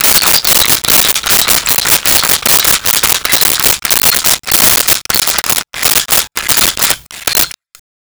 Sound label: Gadget, Mechanical